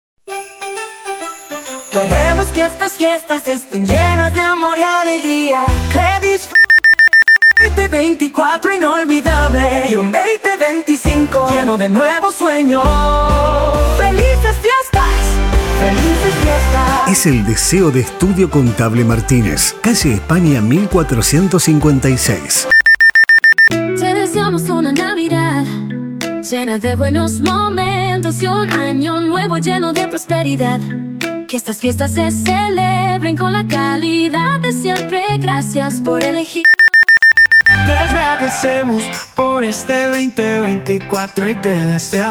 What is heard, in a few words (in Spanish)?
jingles; saludos comerciales; fiestas 2024